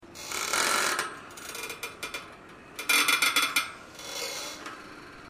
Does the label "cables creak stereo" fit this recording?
Yes